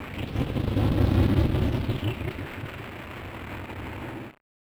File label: Malfo Syn, malfunctioning synth